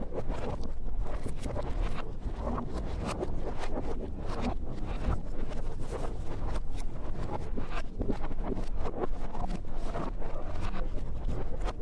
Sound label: Windmill Inside Loop